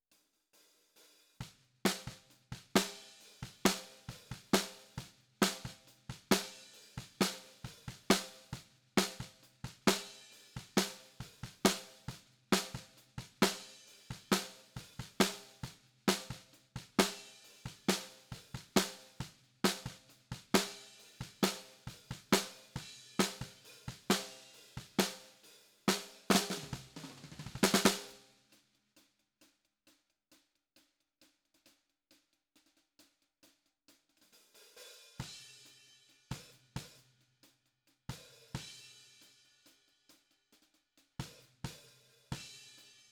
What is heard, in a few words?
Snare Bot